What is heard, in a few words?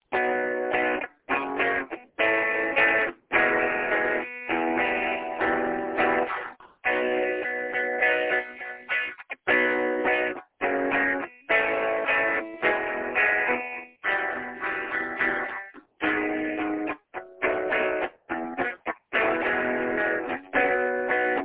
rockin chords